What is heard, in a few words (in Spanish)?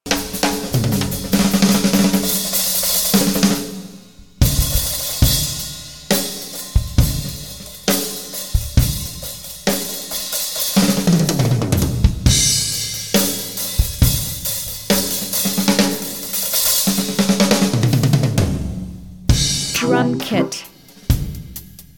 BATERIA